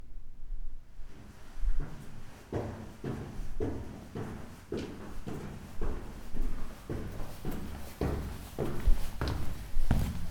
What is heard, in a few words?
Walk Stairs